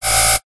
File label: science fiction scanner scan; zapsplat